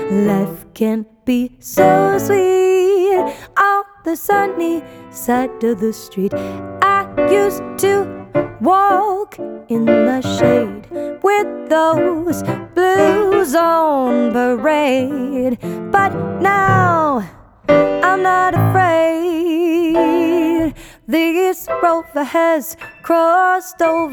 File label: Jazz, vocal